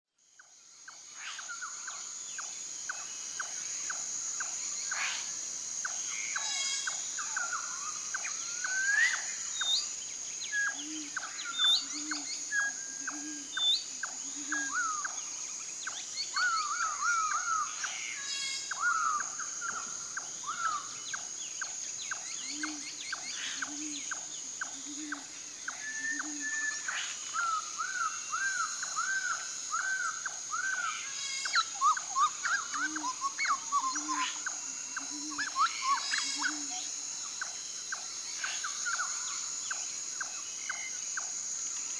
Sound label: superb parrot